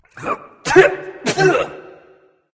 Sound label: nv clear throat; smite; voicepack; mercury